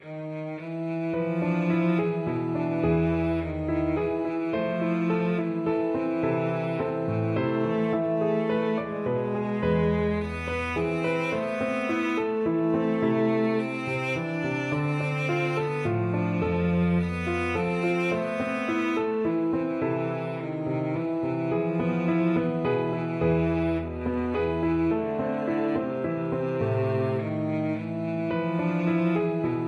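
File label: violoncello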